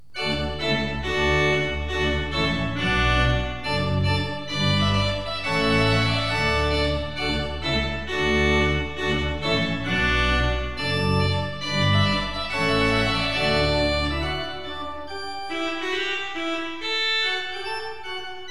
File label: Trumpet Tune in D Major; Purcell